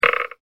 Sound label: turn